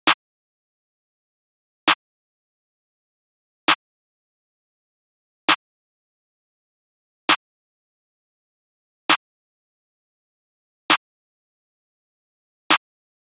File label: Drum Loop 133 BPM Clap; Drum Loops; Loop Stems; Cymatics; Mystery Pack Vol 4; Enemies Drum Loop